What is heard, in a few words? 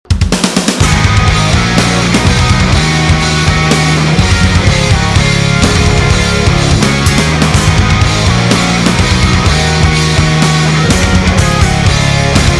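magnificent instrumental break and guitar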